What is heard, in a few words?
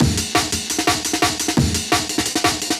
Active, Loops, breaks; m8 breaks